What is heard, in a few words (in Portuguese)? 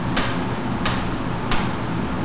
alguns sons